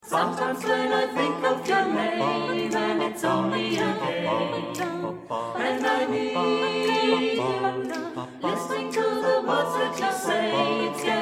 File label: ensemble